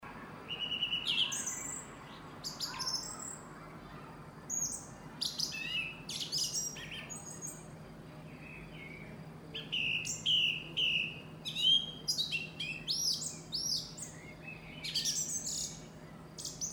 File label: unknown bird